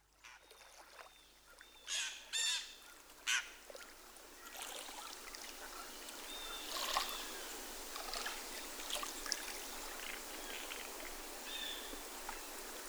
The Island Field Recording, Bois Blanc Island Lake Huron Michigan; 7am